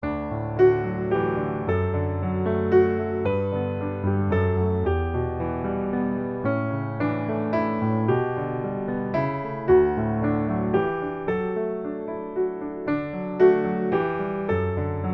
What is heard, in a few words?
piano